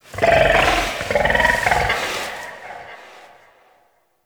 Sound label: Forest Distant Medium Creature Medium Gurgle Snort; Warframe; Grineer Forest